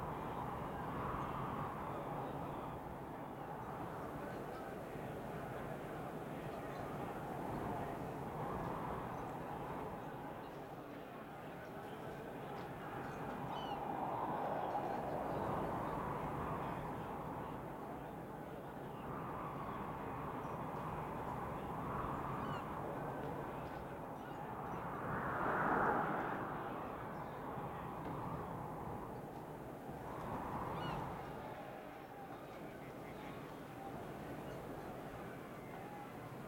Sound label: ambience loop butchers bridge; bilgewater